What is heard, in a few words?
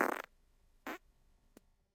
fart elegant